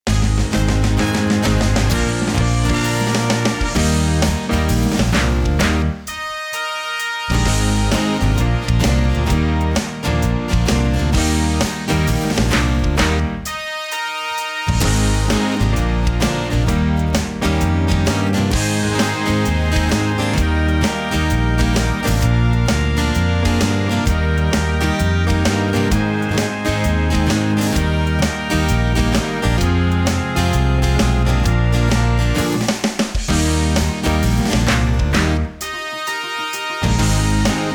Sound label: karaoke